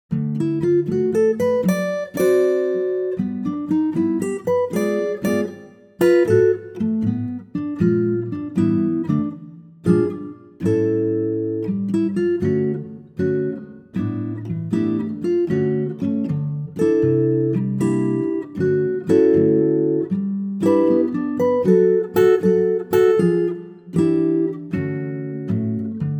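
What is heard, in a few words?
D acoustic guitar; key - D - vocal range - (A optional) - B to D#; Stays in tempo all the way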